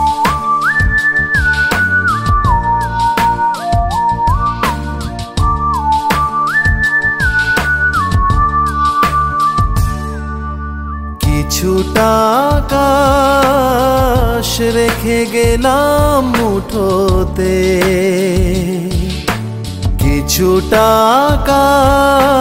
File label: bengali ringtone